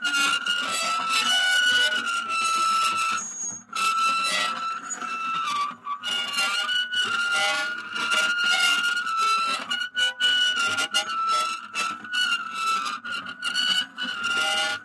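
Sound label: sounds of scratches on metal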